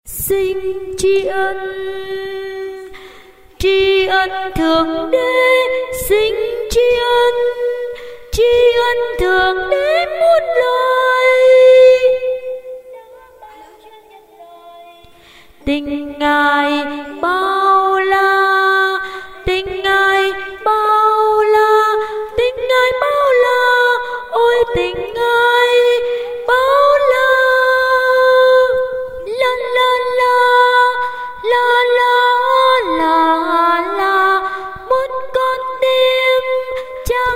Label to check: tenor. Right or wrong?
Right